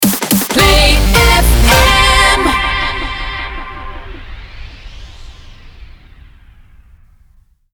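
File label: 160bpm C minor Rapid Fire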